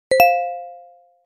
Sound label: techno; htc